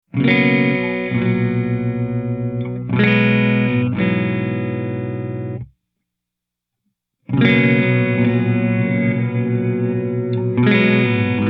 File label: Reverse Delay Baritone Open, reverb